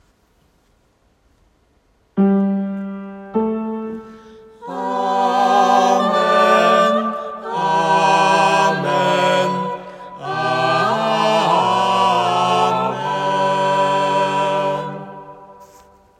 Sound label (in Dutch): Amen 4-stemmig